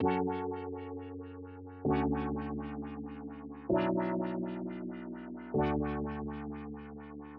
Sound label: filter